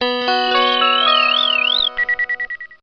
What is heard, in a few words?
Message Cricket; LG C330 Etna